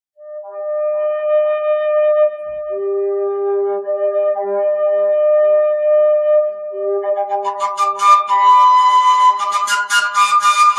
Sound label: Fujara